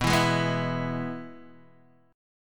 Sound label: E Major B